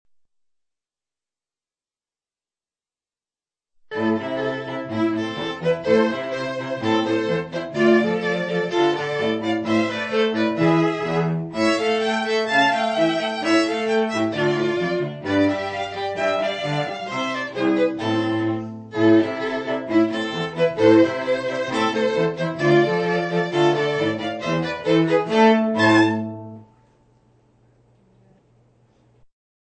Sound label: trio